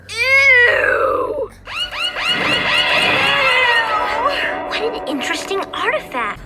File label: ewwwww; bratz